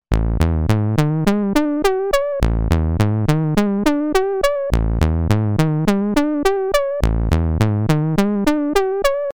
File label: doepfer fifth